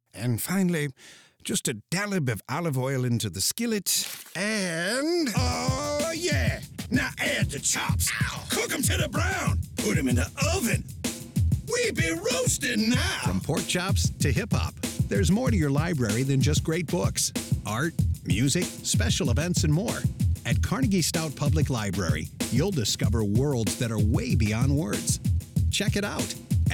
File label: Hip Hop